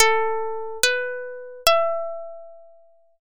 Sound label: koto